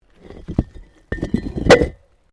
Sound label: Rock Move; Material components for constructing more complex sounds; Rocks; Materials